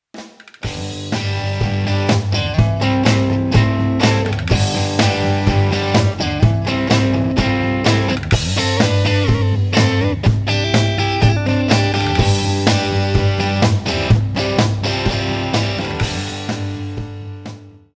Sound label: Punchy Crunch Direct